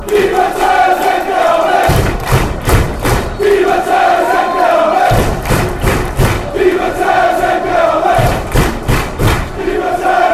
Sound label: fan chants; di matteos mk army